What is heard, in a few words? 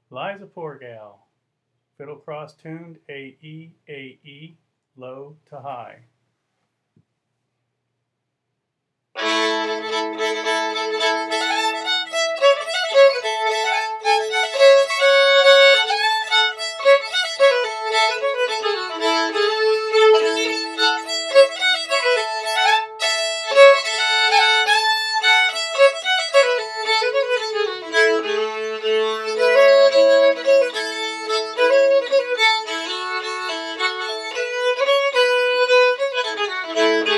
fiddle; festival tunes